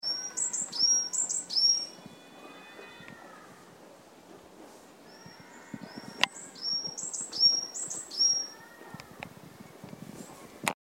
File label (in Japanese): yamagara song, tori